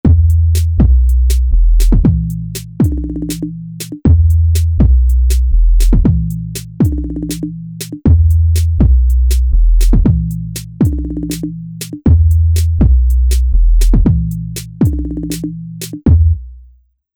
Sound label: Ambient